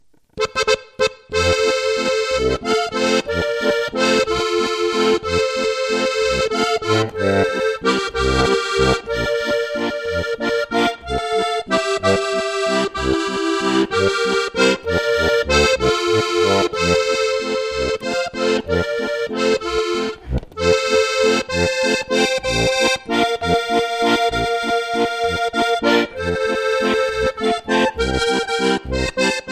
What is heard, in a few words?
waltz